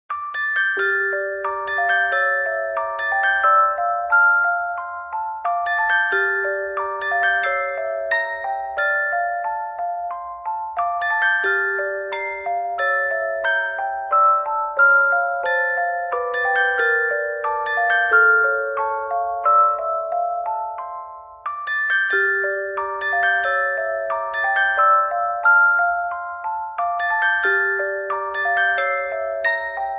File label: Kalimba